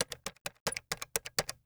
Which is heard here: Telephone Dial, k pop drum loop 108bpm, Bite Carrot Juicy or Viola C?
Telephone Dial